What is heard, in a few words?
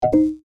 button incorrect